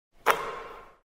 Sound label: basketball bounce